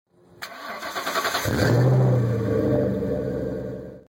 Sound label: Flowmaster Startup